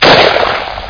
ber shot; matrixhl; weapons

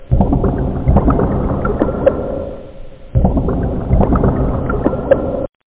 blubber; OctaMED SoundStudio V1

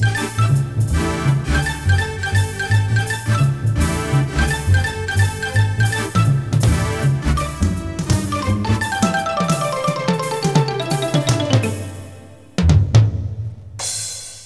Menu Music; Mega Game; Sheep Demo

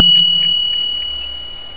bell three; Show Doze Fonts 1.0.1

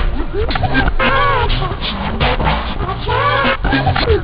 spin, scratch; OctaMED SoundStudio V1

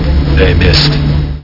missed; Amiga 8-bit Sampled Voice; worms dc; arnie

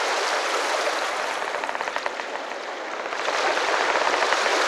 ship stand; Alexander

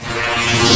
tearin; Action Dome

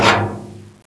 barrel; Spider-man Cartoon Maker; brushes

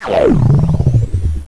Board Slowmo; Cloudz 1.0.3

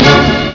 Start game; Outland; Reversi

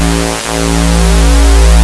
Plasma Ball; Cyclone II

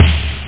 Agony Snare Drum; MarcDrive

